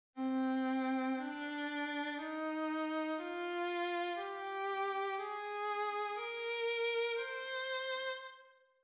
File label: aeolian, modes; mode patterns